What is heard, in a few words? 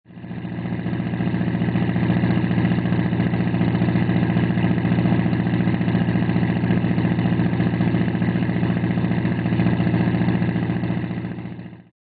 march fart